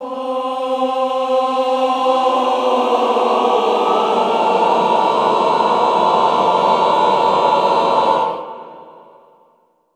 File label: Classical Choir; Best Service; Extended Classical Choir